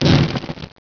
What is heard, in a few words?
fireball; QB64-PE